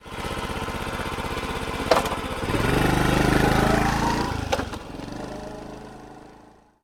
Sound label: cub start throttle; anemoi